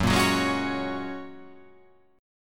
Gb Major Add 9th, Gbadd9 chord; strummed; JGuitar

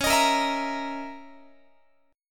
C sharp Minor 6th Add 9th C sharp, Guitar; C#m6add9 strummed

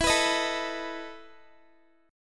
E Major 7th Suspended 4th E down Guitar

